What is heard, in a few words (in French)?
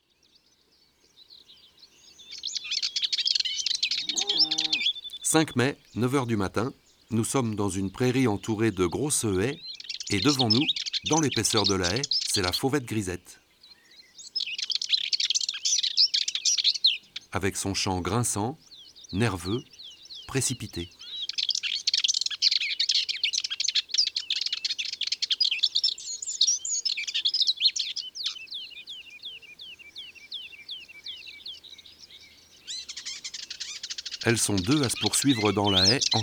Fauvette grisette; ABC des chants d'oiseaux